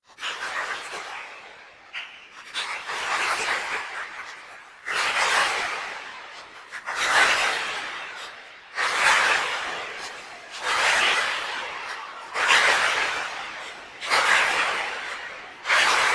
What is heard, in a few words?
applause